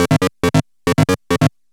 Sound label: 138 F#, pure-synthl, Techno; instrument-loops